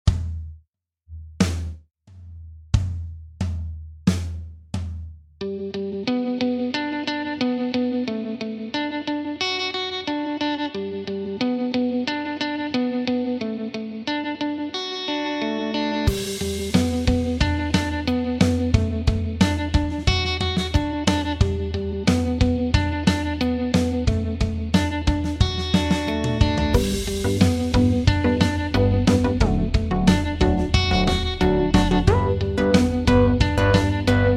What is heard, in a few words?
Simple Melody, Guitarbacking